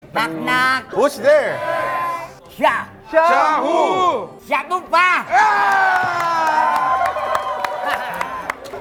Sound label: knock knock